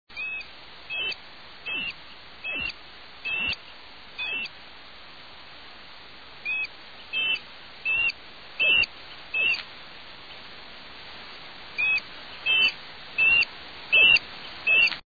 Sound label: Sharp shinned Hawk